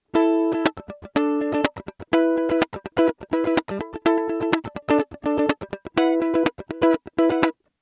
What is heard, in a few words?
mandolin comparison electric chords tb